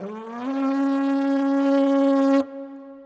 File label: HORN; reaperman; giants